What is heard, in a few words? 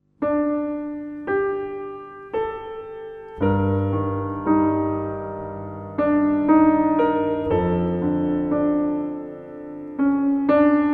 Slow Minor Piano Theme Piano and Strings; Gratomic